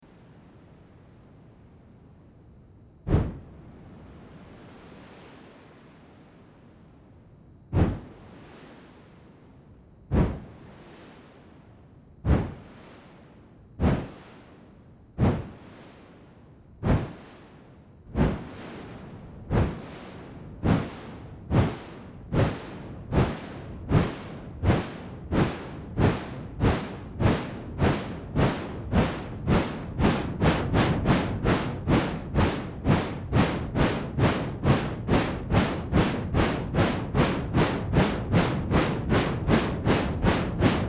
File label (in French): MS-20 locomotive vapeur